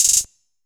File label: HAT ROLL, Closed Hats